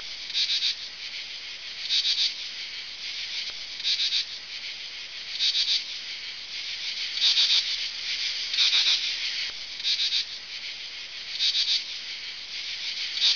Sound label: insects, forest